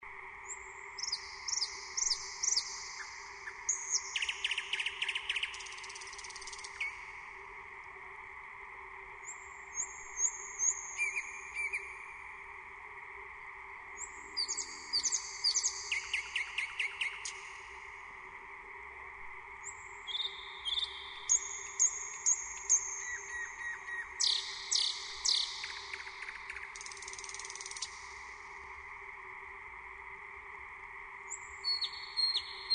nightingale sound

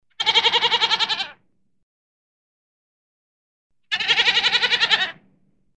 goat sound

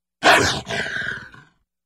wolf sound

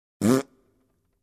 fart sound

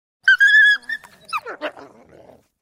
wolf sound

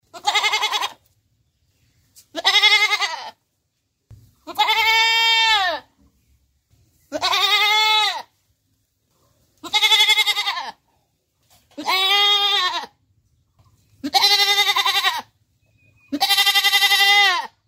goat sound

